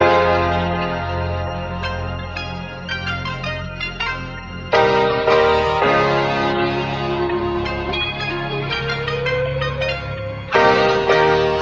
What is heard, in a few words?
piano keys, Phonky; loops